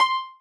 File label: marimba rainbow; ゆめ2っき